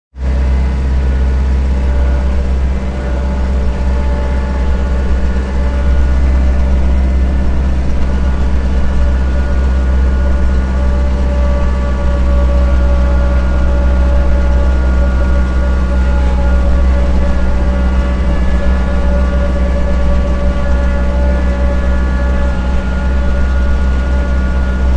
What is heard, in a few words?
Small Farm Tractor Cab, Inside tractor cab; Tractors; Vehicles